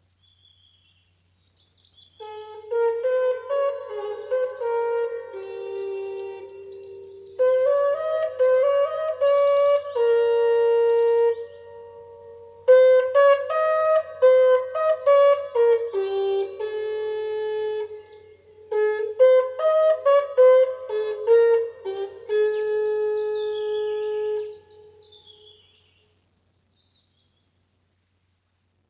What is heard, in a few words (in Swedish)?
Hornlåt; gagnefs minnesstuga